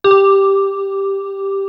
CHIN BEL, BELLS; East West - Global Sounds

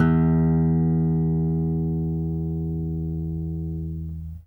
AC MED SUS; Quantum Leap; Guitar & Bass

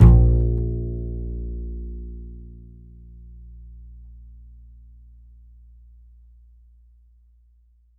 A, Upright Acoustic Bass

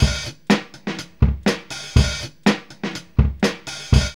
OPEN WIDE 124, Funky Elements loops; Zero-G

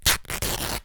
Rip, cartoon Foley Fx; musicradar; cartoon caper

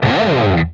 PRS SLIDE, guitar

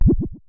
Synare Filter; Star Instruments Synare 3

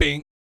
BASS FX, Vocal Planet, Jazz FX; Spectrasonics

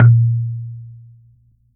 coconut; Q Up Arts; Denny Jaeger Private Collection

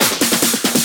Fills, Loops 140 BPM

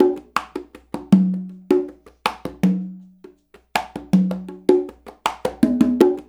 loop, congas; Percussion; InVision Interactive; Percussion Slam

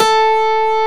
DIDI, SYNTHESIZER, DIGI WAVE; AKAI S6000 CD-ROM - Volume 1

